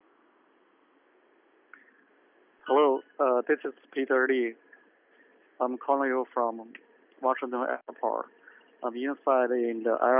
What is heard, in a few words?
airplane noise reduction; noise reduction mobile phone